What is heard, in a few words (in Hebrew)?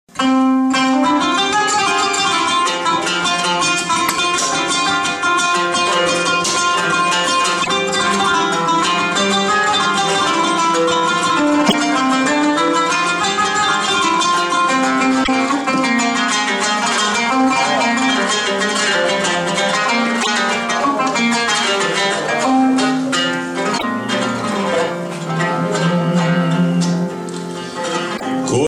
נאווה, מאקם